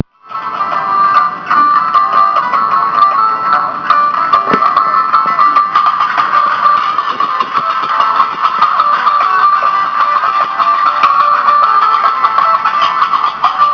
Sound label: gnawa; Gnawa music - north African style, Essouira, Morocco; Each of these were originally recorded on my trusty Psion